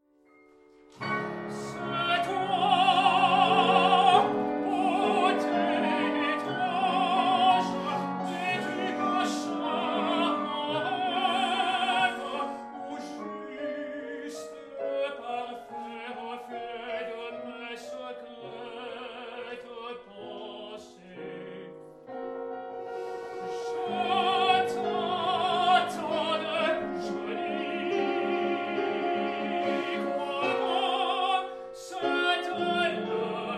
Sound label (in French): prince tenor